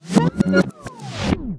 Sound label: Rewind